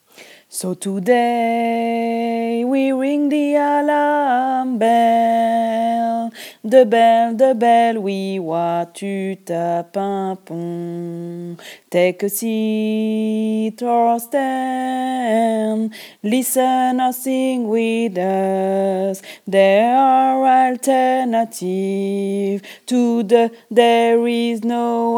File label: refrain alto